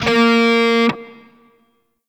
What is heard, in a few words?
Grineer Shawzin Oct Two A Sharp; Warframe